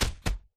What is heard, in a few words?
spectre curb stomp grunt; Titanfall 2